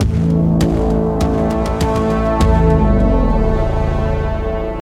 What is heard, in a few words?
Transition down; Terra Invicta; Solar System Layer Test